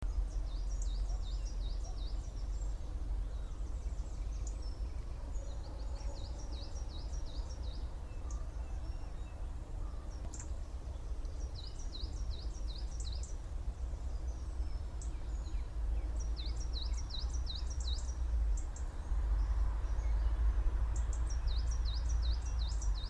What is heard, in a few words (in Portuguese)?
chapim-carvoeiro Periparus ater